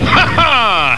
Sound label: haha